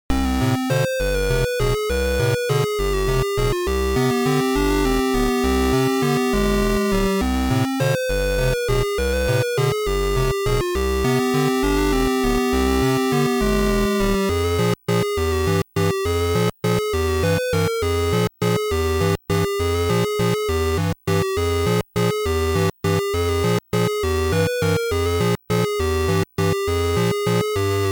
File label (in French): LA VALSE